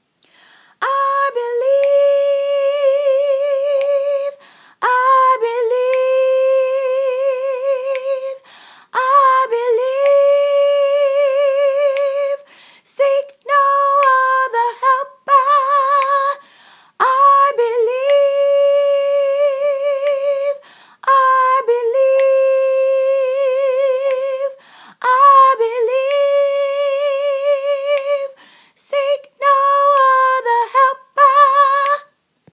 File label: soprano; be thou content